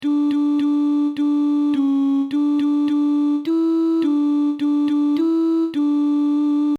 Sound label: Alto; Allelulia